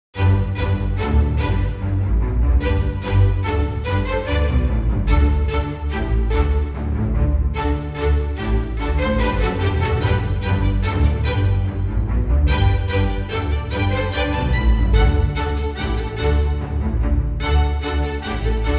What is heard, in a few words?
Strings